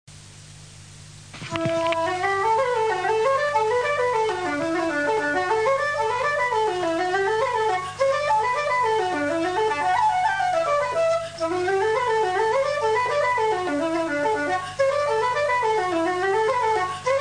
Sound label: flute